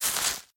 Obstacle Grass; In Obstacle Grass

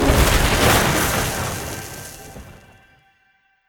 Spell Enchant Ligntning

